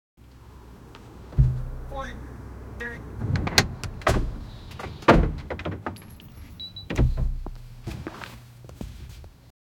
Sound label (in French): une portire de voiture so, exterior